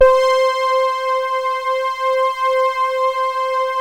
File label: C5, ANALOG PLUG; Sound Gallery - Charged